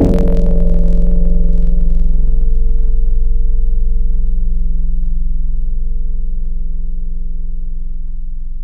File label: Chroma, Tropical Mallets; Synthetic's Serum Collection; SparkPackers